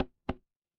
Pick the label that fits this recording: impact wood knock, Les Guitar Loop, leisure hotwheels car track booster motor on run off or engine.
impact wood knock